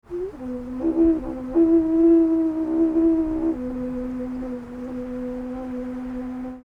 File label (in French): plastorgue medium